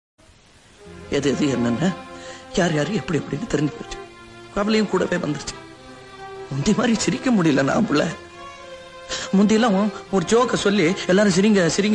tamil; vetri vizha; kamal hassan

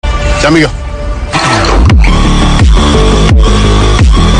malayalam message tone

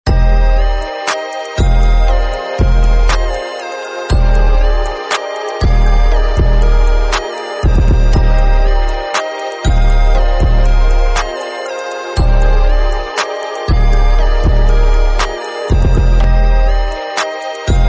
remix bgm theme

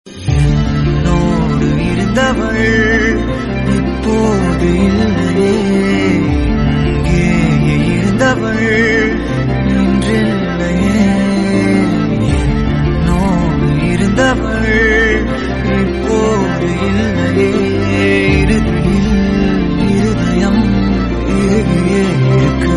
tamil song

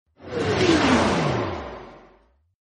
woosh

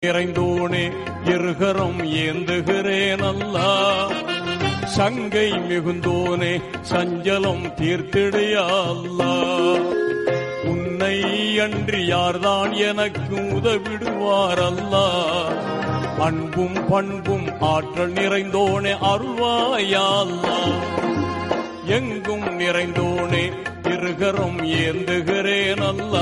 tamil islamic

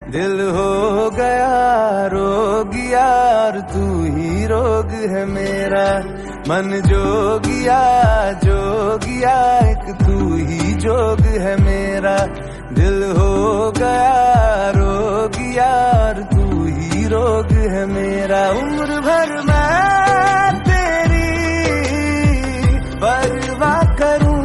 hindi

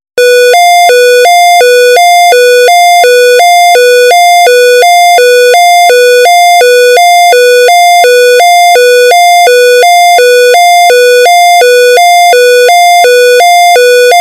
siren

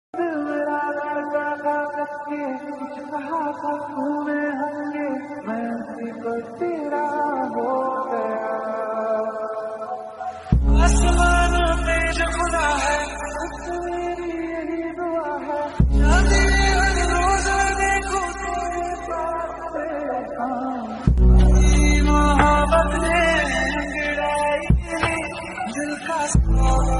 hindi; bollywood

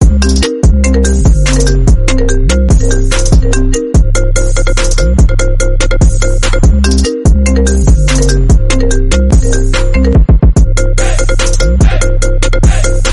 iphone ringtone instrumental ringtone

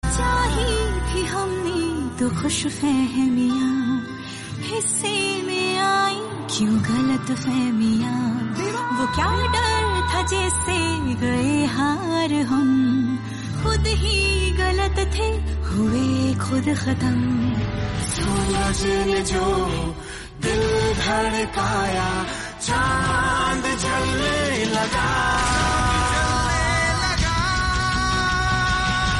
hindi song

